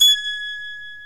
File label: BASIC ENSMBL; OMI - Universe of Sounds